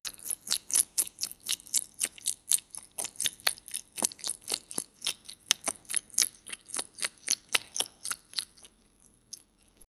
eat spaghetti with juice

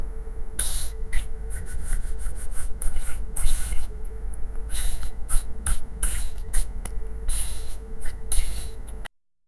asmr of applying, beauty

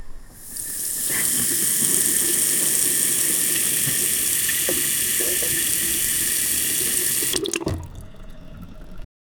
turned on sink; plumbing

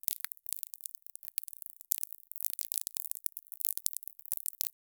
ultra close asmr of; seed

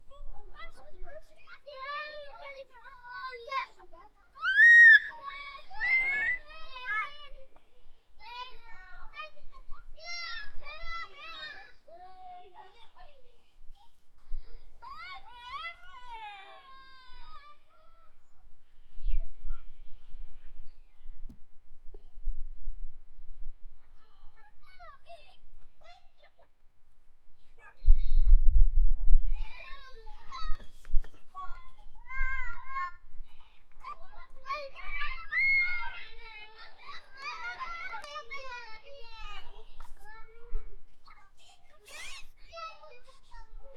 children playing in a, playground

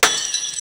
mining sound for a block

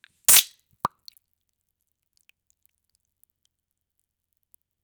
the watermelon bursts and, food

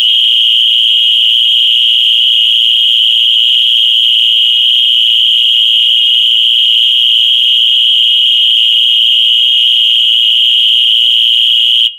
high pitched alarm blaring in an emergency; safety

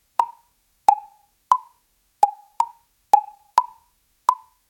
poink dart sound; games

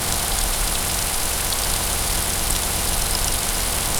soft breeze light rustlin

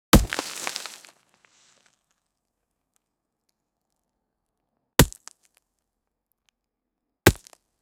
hoe hitting soil soft ear, farming

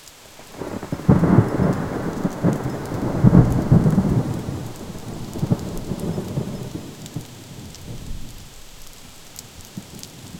intensifying rain distant

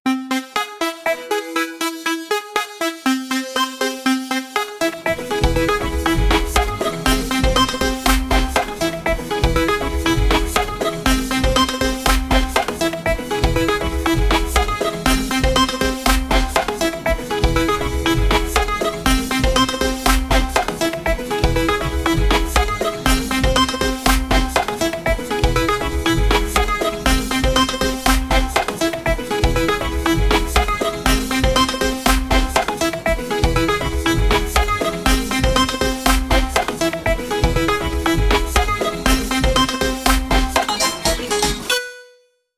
bubblegum pop dance

